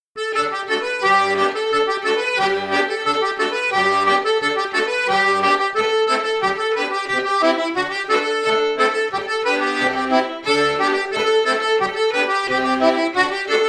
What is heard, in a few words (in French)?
ronde de loudeac rapide